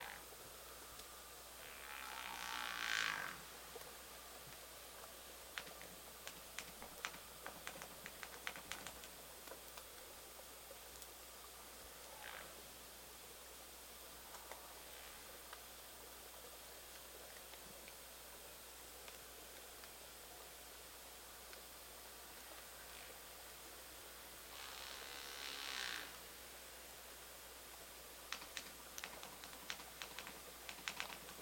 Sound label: humpbacks, megapclicks; biophony; inland WA BC; rpi orcasound lab